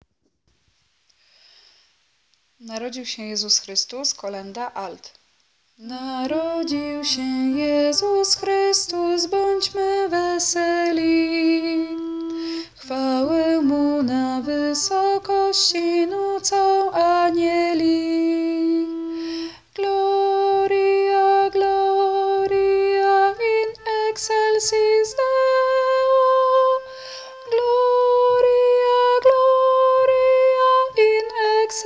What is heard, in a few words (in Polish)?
Narodzil sie Jezus Chrystus alt; Boze Narodzenie